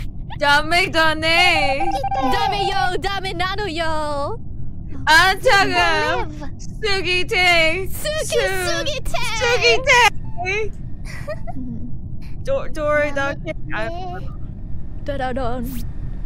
Singing; KroniIRySinging

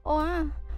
noises

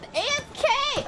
Scream AFK; IRyScream

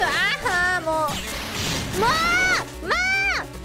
Scream Mouuuu; IRyScream

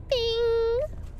ping

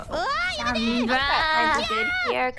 uaaah yamete gyaaaah; IRySJP

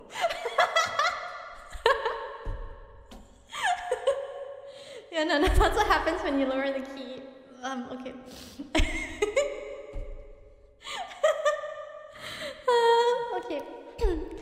Laugh; IRyS

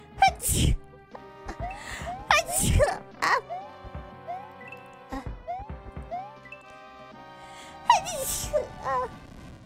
Sneeze

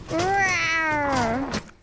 roar

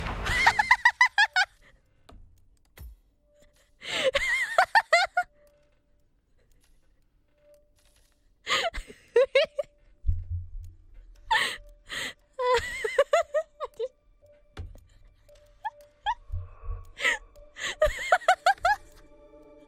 laugh

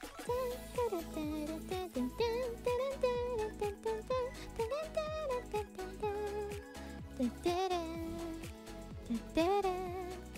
Singing; IRyS

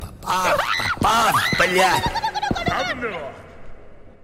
Screaming at old man; IRyScream

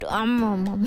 nomnomnom

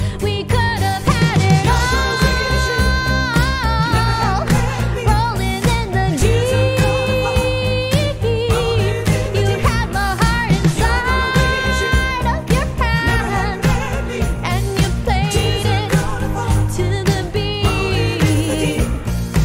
karaoke

